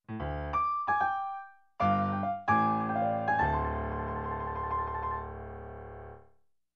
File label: Blues Piano Phrase